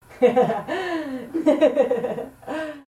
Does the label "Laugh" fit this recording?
Yes